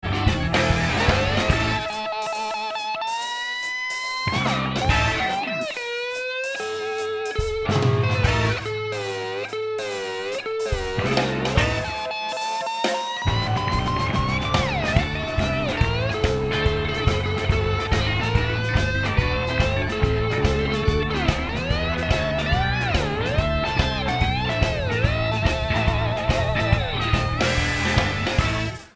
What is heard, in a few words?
plays slide guitar